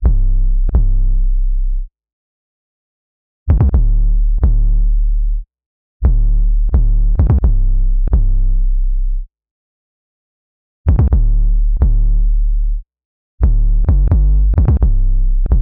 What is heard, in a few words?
beat loop 808 130 C; cheddar